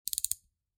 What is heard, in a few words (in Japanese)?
カッターの刃を出す音